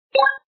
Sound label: buttons; groof runner